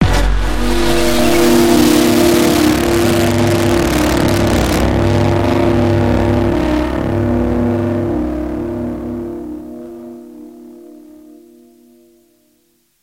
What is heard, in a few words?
pong